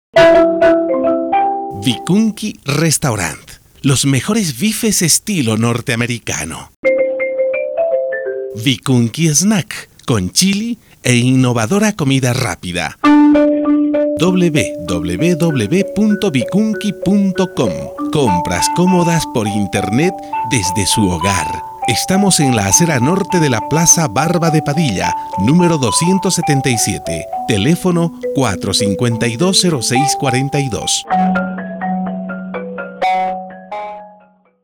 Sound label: jingle, custom panpipe